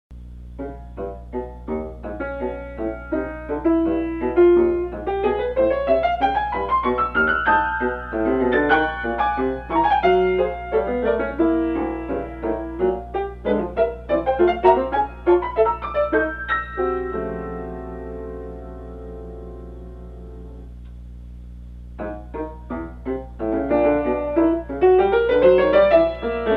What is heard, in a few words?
Piano Prelude